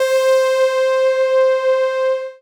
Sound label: LEAD Digikordion - C, Fantasy Synth One Shots; Prologue; Cymatics; Fantasy Synth Sample Pack